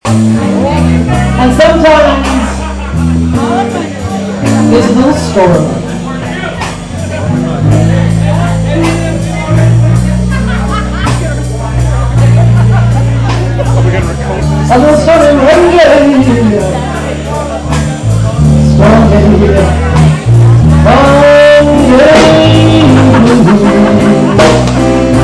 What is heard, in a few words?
blues vox